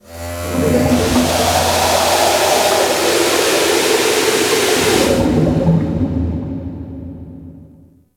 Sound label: Steam Blast Large, Ocean; Warframe; Grineer Ocean